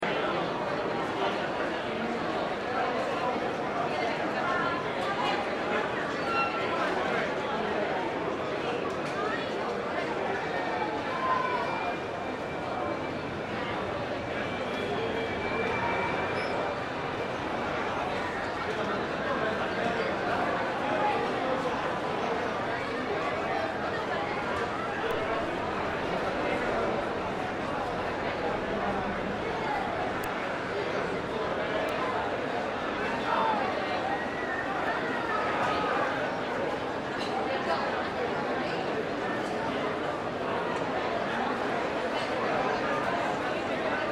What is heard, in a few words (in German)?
Party, Haushalt